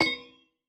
dark crystal land, move

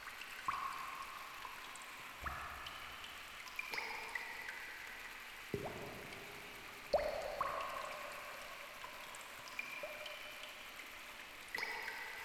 cave water drops; CrossCode; background

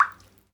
drip, water; World Of Goo

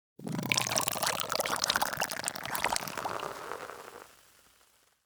tank fill; World Of Goo

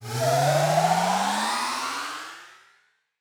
charge fast; upgrade

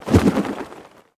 samurai jump with cloth; battle

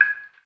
electric light blink; The Swapper

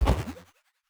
ferro wave hit

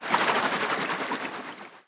bubble swirl; jellyfish; battle

